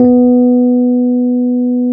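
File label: E PNO B2; Synclavier Sampler Library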